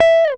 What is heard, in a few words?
GTR TRIK, Jazzistic; Univers Sons